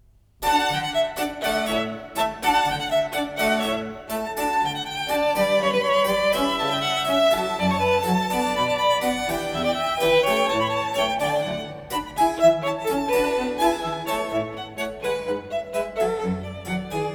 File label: Trio Sonata For 2 Violins And Basso Continuo No.6 in D major; Vivaldi